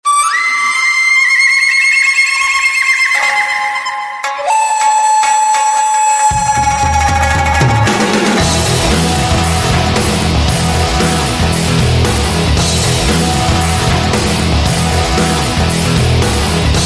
摇滚 战斗 激情

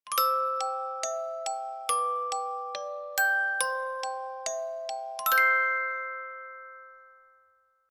睡觉

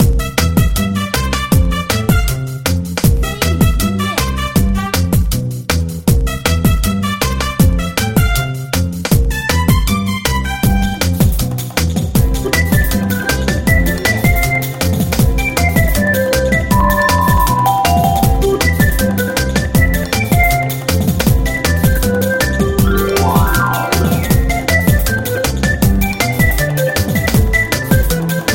活动音乐

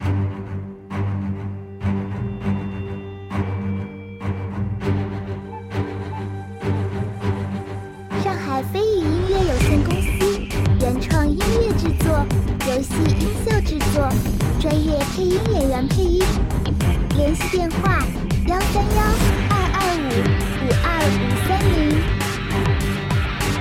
中国风摇滚紧张热血战斗; 鬼武三国志; battle

